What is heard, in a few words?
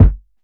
sharp kick G key